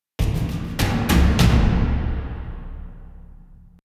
Sewers Combat Outro; Novigrad

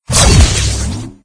Teleporter Out; doodle jump 2; android

